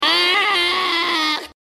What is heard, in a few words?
screaming